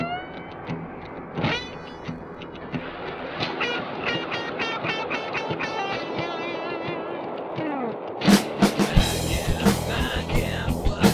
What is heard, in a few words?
children percussive